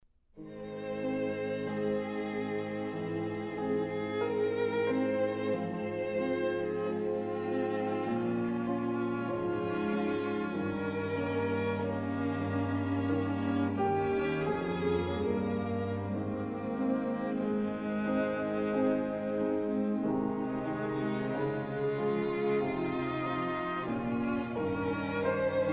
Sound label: piano quartet; foote